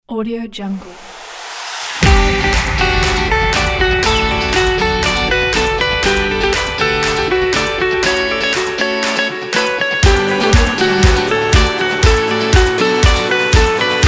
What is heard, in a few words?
summer pop